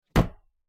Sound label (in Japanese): 脱いだ服をシーツの置く音